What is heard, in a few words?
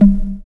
DR. LOOP, DRUMS; X-Static Goldmine 3